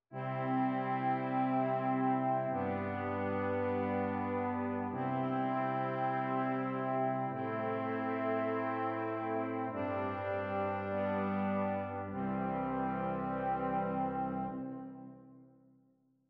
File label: Brass layer harmony